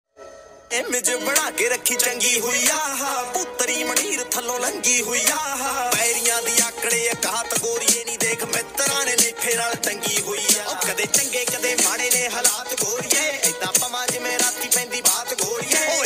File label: Punjabi Beats Ringtone